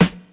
00s short snare drum sound f key, snare sound tuned to the F note; snare drum samples